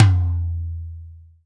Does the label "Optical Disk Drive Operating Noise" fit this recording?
No